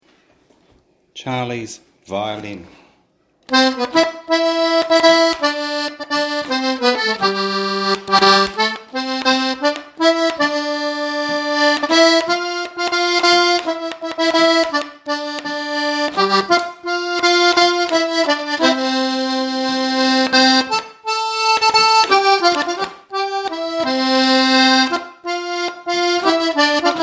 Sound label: Violin